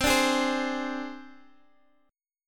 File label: C Suspended 2nd Sharp 5th C Guitar Standard; strummed; JGuitar